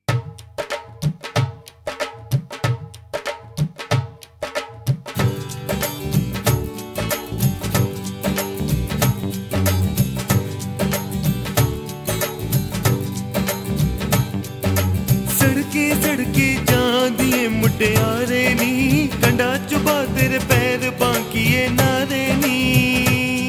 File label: Fast